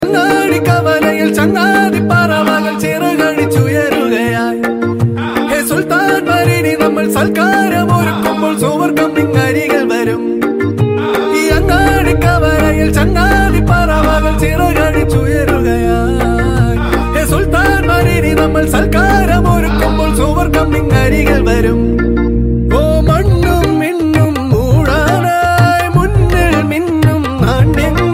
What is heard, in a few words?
Tone for Android Mobile